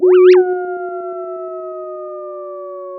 Sound of a police car siren

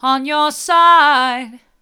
hard, vocal

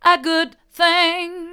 a good thing hard, vocal

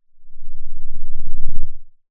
Sound of a lion’s roar

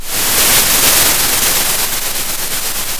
pop, Sound of a thunderstorm

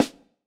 Snr, drum

